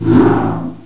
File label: swing; quake2